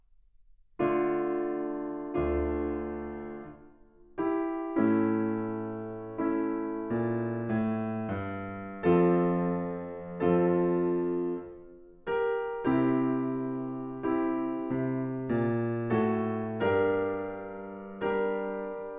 Suite in D minor Sarabande, Piano Sonatas and Suites; Suite No. 4